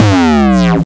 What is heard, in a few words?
BD Distortion, Bassdrums; Vengeance Samples